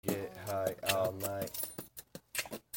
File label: Beat Box